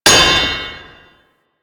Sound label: Worker Hammer Impact Metal, weapons; Grn Worker Hammer